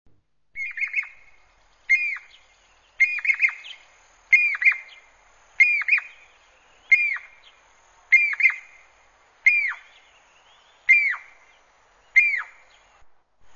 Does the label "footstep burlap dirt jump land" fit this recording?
No